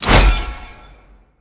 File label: charge; smite; god tiamat